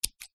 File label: office scissors cut air single snip